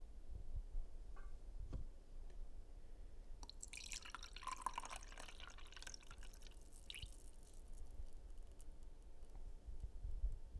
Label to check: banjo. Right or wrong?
Wrong